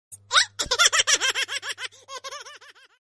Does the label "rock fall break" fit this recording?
No